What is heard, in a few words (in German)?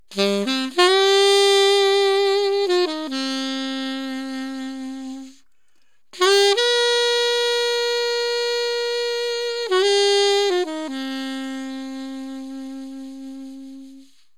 sax